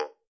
ultimate jaw harp

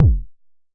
Jomox MBase, drum machine; Jomox MBase 01

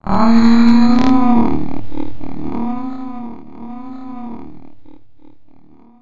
mmmmmmmhhhnna, Robotic or Stuttery or Spooky

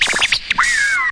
fancy scratch; Scratching and Turntablism

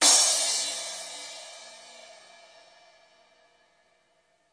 stereo crash, drums single hits

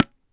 hard tabla Te, Tabla Kit Hard; ETHNIC and WORLD PERCUSSION

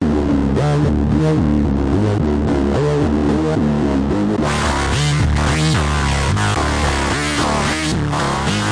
110 deep frozen synth, BASS LOOPS

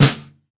Pearl kit snare; REAL LIVE KITS

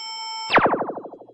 boss fire; VIDEO GAMES

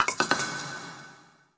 four hit ambience; Weird and Interesting Hits; single hits

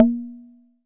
Jomox MBrane, drum machines; Jomox MBrane 11

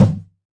floppy bounce kick

